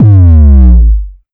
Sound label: Bass Drum long